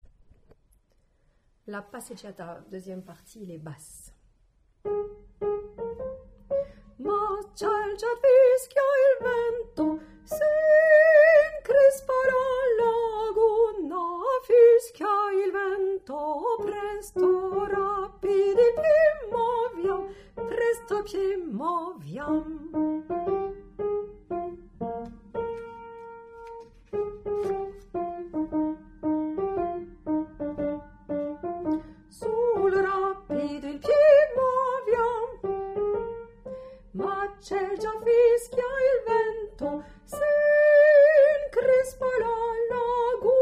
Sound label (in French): Basse